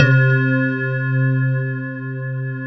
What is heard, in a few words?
WAVEOLA C3; Big Fish Audio; Synth City; OLAKEYS